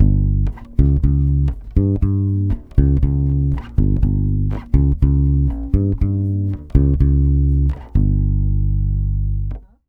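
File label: 120 bass G diminished, brazil bossa; USB Soundscan; AKAI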